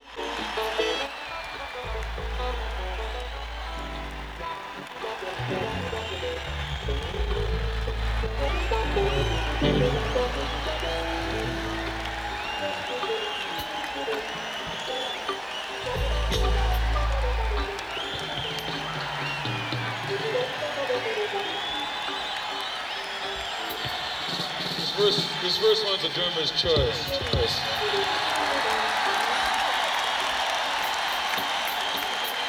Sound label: Crowd Tuning; beyer m160